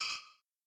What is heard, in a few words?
Instrument